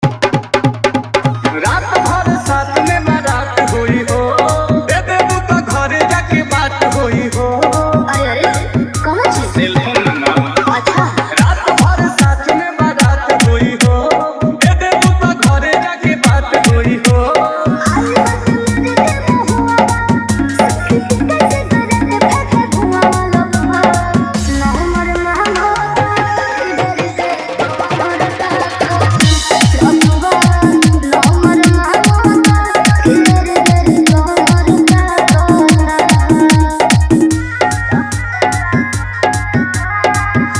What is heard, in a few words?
Hard Bass Jhan Jhan Toing Bass